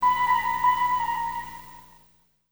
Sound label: BEL, Hip Hop and R&B Drumloops; Best Service; ProSamples vol.01; AKAI